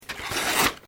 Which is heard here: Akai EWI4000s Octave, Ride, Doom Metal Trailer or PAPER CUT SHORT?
PAPER CUT SHORT